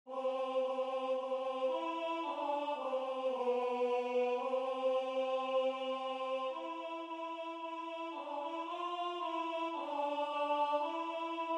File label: NOEL UKRAINIEN Tenor; Anonyme – XVIIIe S.; Xaramela